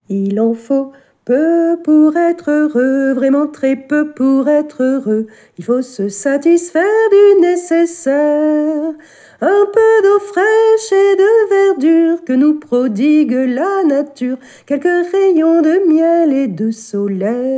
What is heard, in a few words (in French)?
chante